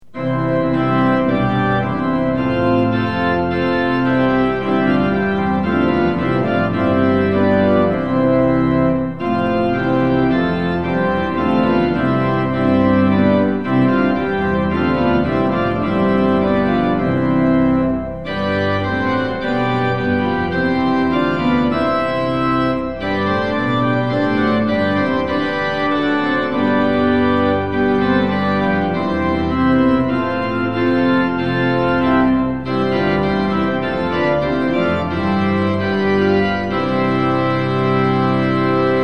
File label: tune; hymns; lillenas